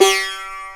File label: DrumBase, GAMLEAN; Crypton - DrumBase5000